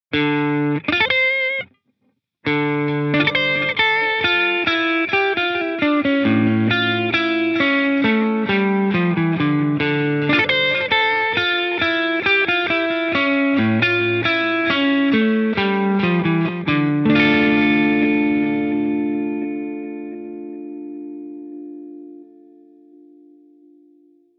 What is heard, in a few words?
Mod Waveform